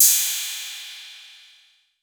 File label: 808 CYM; Wizoo - Platinum 24 Electronic; 808 SOFT P2